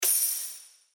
get coin; football; Brazil

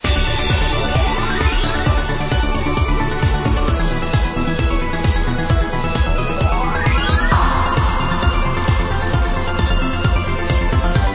BGM big win; football; Brazil

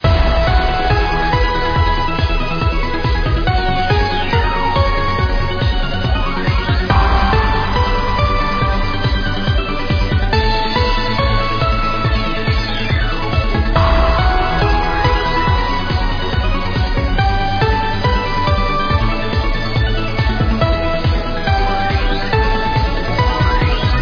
superwin, football; Brazil

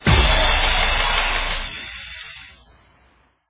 BGM big win end; football; Brazil

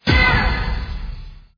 UI Wild; football; Brazil

